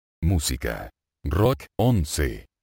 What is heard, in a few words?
rock